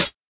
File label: low treble pedal hi-hat sound a sharp key, closed hi hat sound